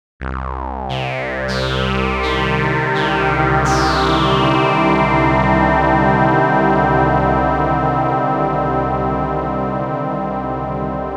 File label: 12dB Sweep